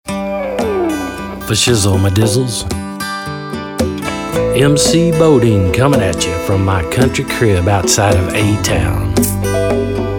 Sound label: Rap